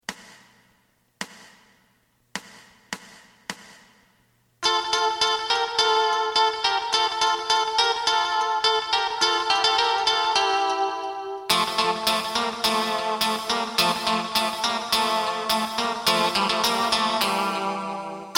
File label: bass